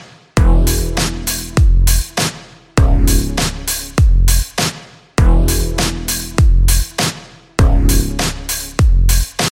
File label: phone ringtone; microsoft surface phone